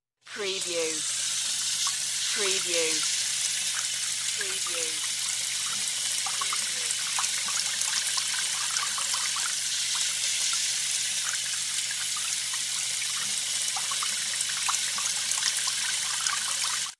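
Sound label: Laundry Faucet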